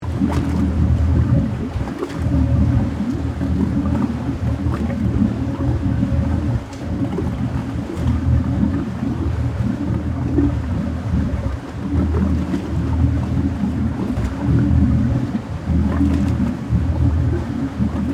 Transportation, Watercraft, Boat, Idle, Dual, Engines, Water, Gurgle, Police, Marine, Loop; Division, Cherokee; BlastWave